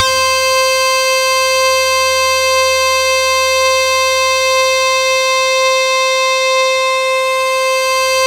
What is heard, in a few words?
C5, Synth Line; 90 s Sample CDs; NiceBeats; SynthLine VOL-33